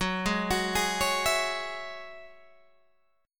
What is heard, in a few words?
F sharp 7th Suspended 2nd, Guitar, Open G; 16,13,16,13,14,14